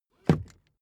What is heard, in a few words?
car seatbelt buckle hit